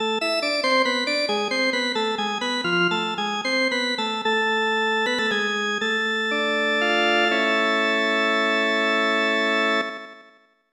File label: Unknown Jingle; Sword World SFC 2; SNES